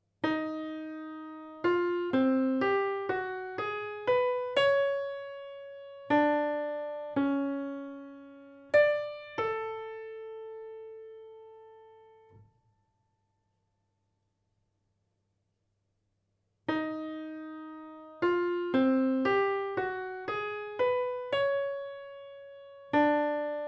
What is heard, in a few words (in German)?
atonal